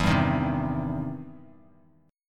E Minor Major 7th Sharp 5th, E, down, Bass Standard; EmM7#5 strummed